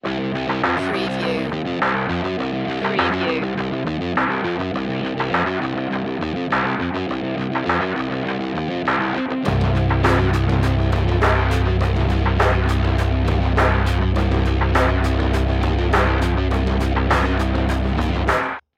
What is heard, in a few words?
dropped riff